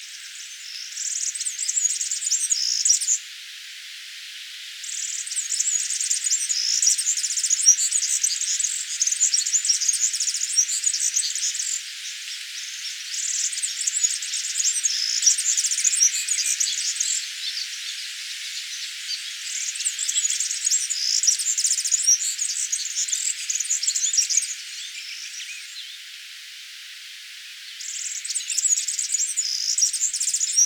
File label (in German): girlitz gesang; vogelstimmen; nabu; lars lachmann